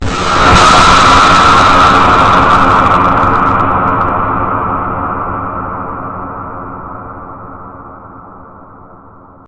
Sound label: warhead explosion